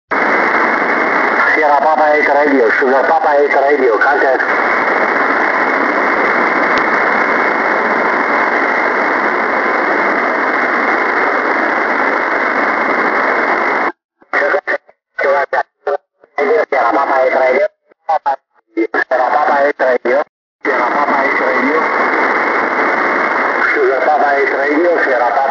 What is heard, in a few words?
ham working